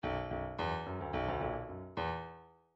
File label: bdf midi file grooves rock d rock d